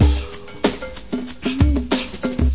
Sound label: 94 funky wave drummer, drum loops and breaks; 091 to 100 bpm